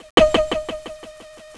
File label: click